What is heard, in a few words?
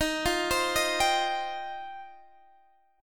G 7th Suspended 4th Sharp 5th Eb